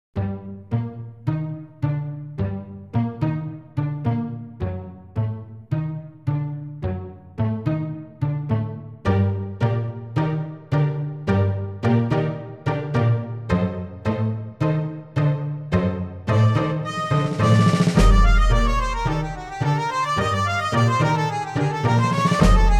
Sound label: Tango